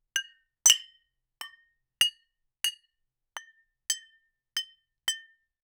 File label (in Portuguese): toque de dois copos, bar